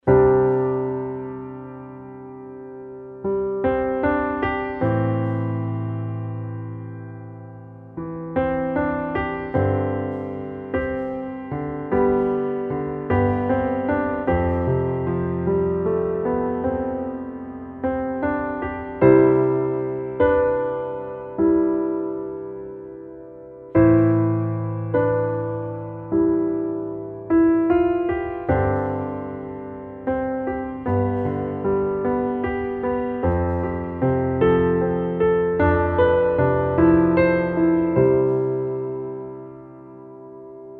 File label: Underscore PIANO; Worship Flow